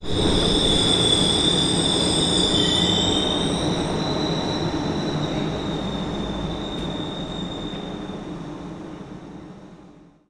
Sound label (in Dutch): Trein rem